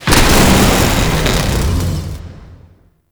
Electricity Zap; Props; Ambience

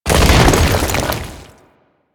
Earth Crack; Condemn; Warframe; Priest

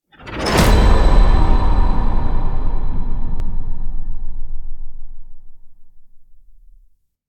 Waiting Room Enter; Warframe; Duviri

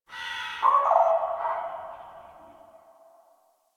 Drifter Camp Bird; Warframe; Quests; The New War

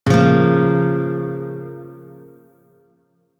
Shawzin Hirajoshi Chord; Warframe; Lotus Shawzin

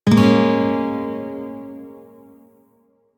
Shawzin Yo Chord J, Music; Warframe

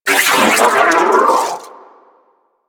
Sentient Battalyst Vocal Death; Warframe; Sentients

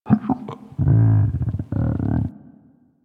Landscape Mushroom Creak, Ambience

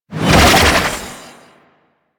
Archwing Prime Sword Whoosh; Warframe; Weapons

